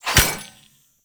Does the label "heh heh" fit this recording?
No